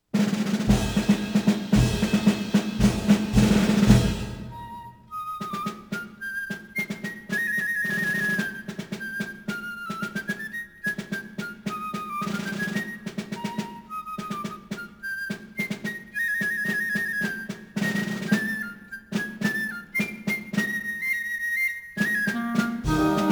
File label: Flute